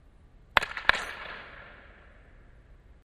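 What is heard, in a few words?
Hockey Medium Impact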